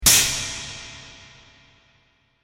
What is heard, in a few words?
Sword Strike Great Hall Echo; Sword Sound Effects; fantasy sound effect